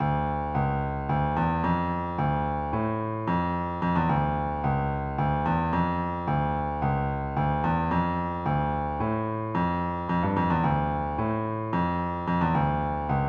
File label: fugue